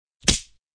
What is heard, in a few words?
Monster Hit Sound; sound effect; Gameton; Arcade additional sounds